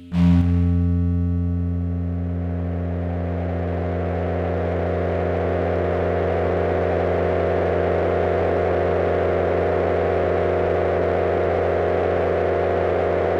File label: PAD Space Didgeredooo Long